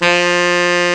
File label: SAX F2; AKAI S-Series CD-ROM Sound Library VOL-8